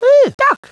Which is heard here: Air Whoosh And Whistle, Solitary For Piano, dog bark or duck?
duck